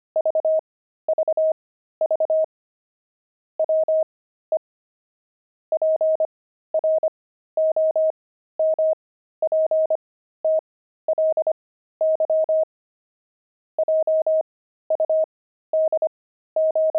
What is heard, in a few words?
pangram, intermediate pangrams files